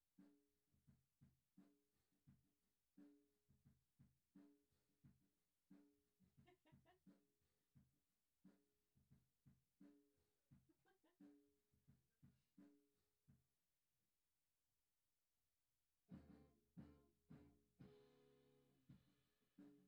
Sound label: GTR